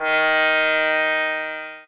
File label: sax